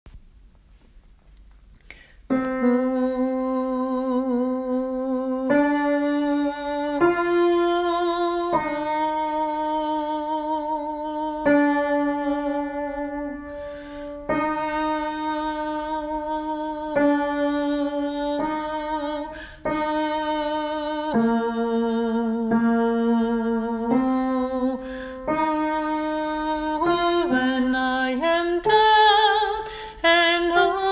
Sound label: bass; åfjord